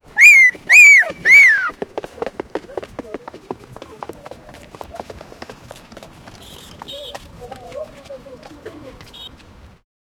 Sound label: fast footsteps, street